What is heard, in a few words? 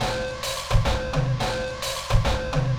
Drum Loops, Distorted; Zero-G Smoke